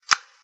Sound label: reload; Dark Village